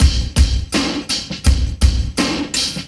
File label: farting, Boombap drum loops